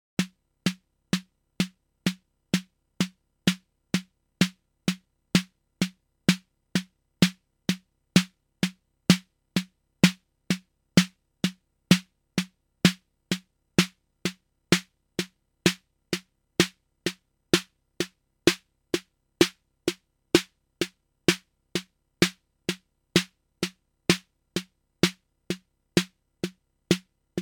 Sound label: TipTop Audio SD808